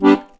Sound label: HOHNER G, akkordeon; Best Service; Alpine Volksmusik